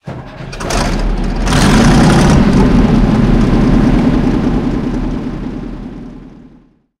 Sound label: panther engine int start, tanks; War Thunder